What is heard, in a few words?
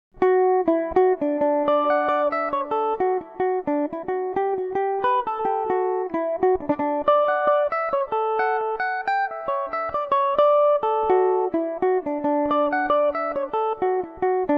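D major revelation, Irish mandolin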